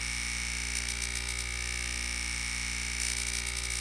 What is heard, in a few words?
neon